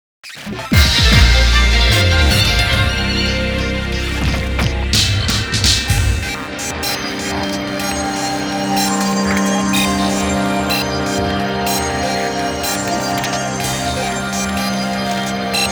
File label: Victory; The Legend of Legacy HD Remastered 2024